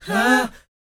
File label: BOYZ G, Vocal Planet R&B & Dance; ILIO